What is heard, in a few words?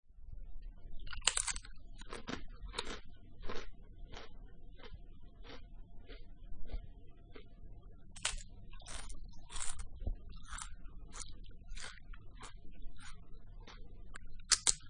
crash crash crash